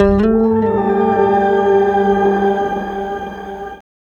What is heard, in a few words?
Bass FX